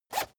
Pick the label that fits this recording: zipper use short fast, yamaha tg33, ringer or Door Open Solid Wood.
zipper use short fast